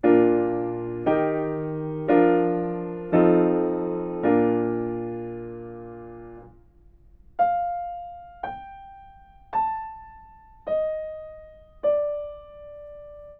melodic fragments

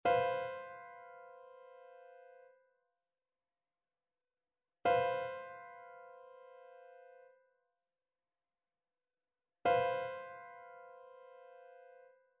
trichord; trichord identification

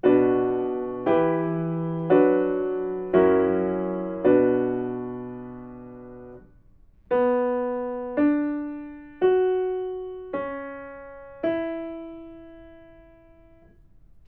melodic fragments; eartraining

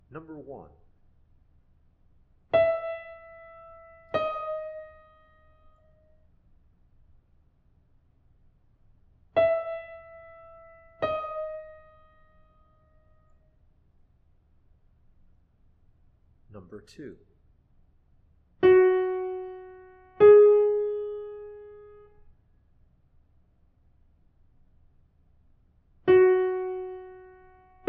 intervals; eartraining

